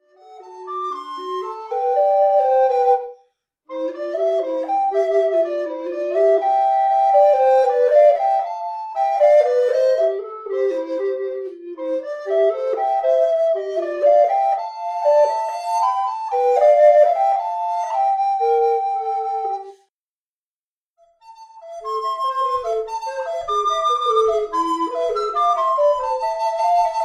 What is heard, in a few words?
Street Music for Two